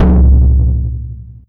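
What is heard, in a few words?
TOM loud; Zero-G; Chemical Beats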